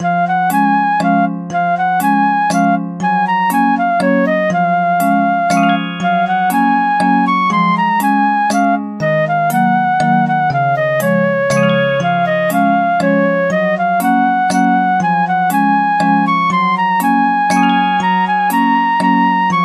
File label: bgm